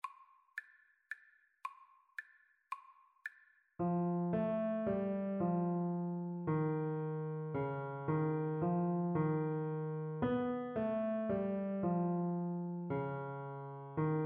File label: melodic dictation; ear training